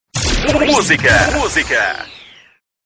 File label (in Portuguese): vinheta